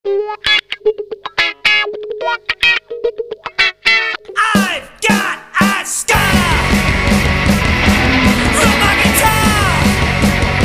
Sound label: scar guitar